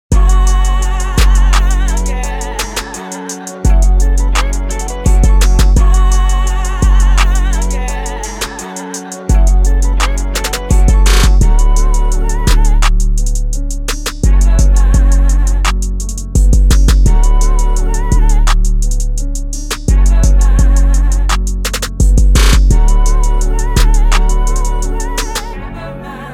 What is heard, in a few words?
INST, 86BPM F#m